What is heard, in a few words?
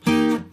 Guitar Confirm; the princess and the frog; Bayou; Music Riverboat